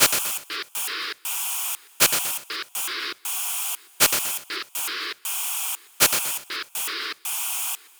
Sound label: Noise, LFO & Noise; Sonic Foundry (Sony Creative Software); Prototechno